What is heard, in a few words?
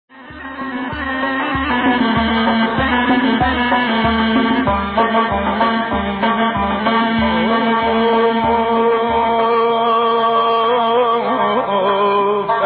kurdish music